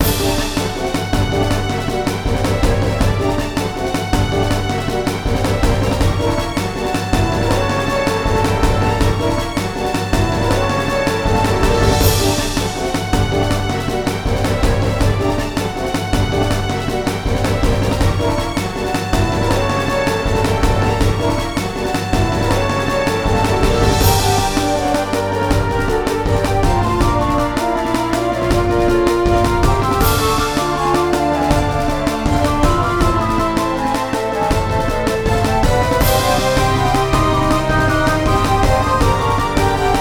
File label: Battle; rpg maker vx ace